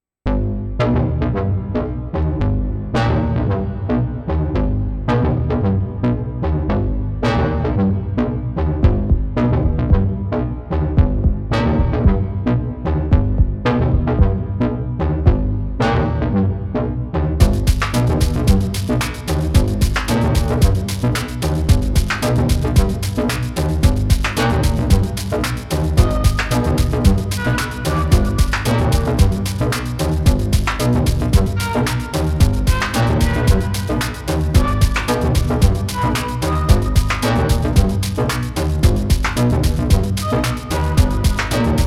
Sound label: Five tracks for the wonky chords, three for percussion. Everything through the FX track at max distortion; Syntakt; Some live playing that didn't go too well…